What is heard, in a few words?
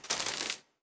elec